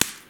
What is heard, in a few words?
Slap